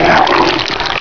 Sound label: bite; hunger; hungerhound; rex